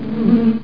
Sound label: hornet m; landslor